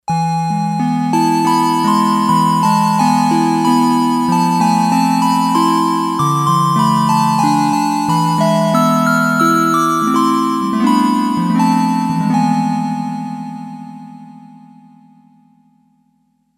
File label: Sy.Ensem., Yamaha DX11; Factory Preset Voices